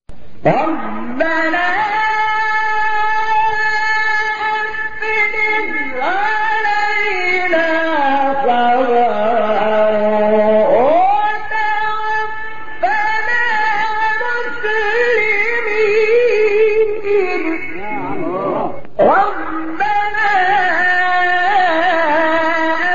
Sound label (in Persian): فراز زیبای دعای قرآنی سوره; اعراف; شحات محمد انور